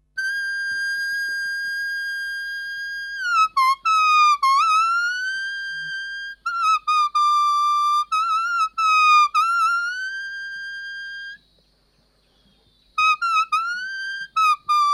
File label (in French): Solo de feuille (anche vibrante)